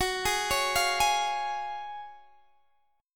Gb Major 7th Suspended 2nd, Guitar Open D

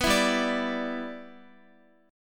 G 6th, Guitar; G6 strummed; x,x,9,9,7,9

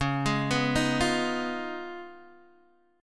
C sharp 9th, C sharp, down, Guitar, DADGAD

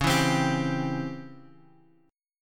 Db Minor Major 7th Flat 5th Db Guitar Open G

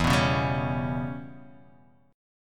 E Minor 6th, Guitar, Half Step Down

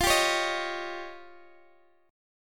F 7th Suspended 2nd Sharp 5th, Guitar, Standard; F7sus2#5; strummed; JGuitar

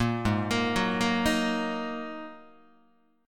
G sharp 7th Suspended 2nd G sharp Guitar; G#7sus2 arpeggiated; JGuitar

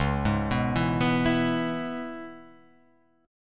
C sharp Minor 7th C sharp, chord

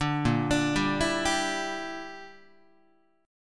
Bb 6th Flat 5th Guitar, chord; Bb6b5 arpeggiated

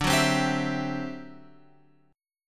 D 11th D

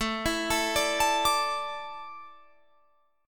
A Suspended 4th, Guitar Drop D

